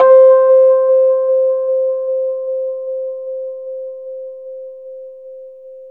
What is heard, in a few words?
RHSM C, Rhodes; 3D Sound; 3D Keys VOL-1